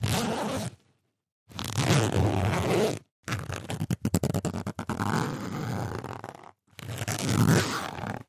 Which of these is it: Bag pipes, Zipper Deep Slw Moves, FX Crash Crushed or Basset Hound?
Zipper Deep Slw Moves